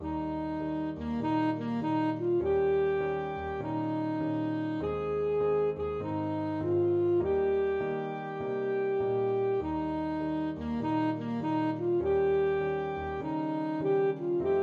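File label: alto saxophone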